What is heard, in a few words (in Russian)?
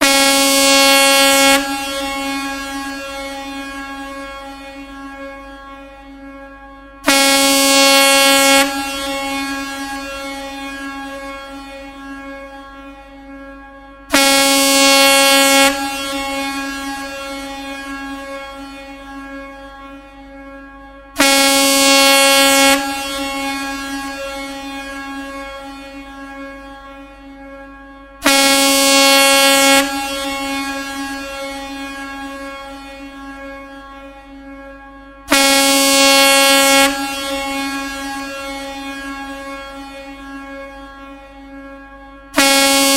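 gudok lokomotiva, locomotive horn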